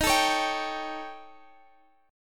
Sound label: D sharp 7th Flat 5th D sharp Guitar Open G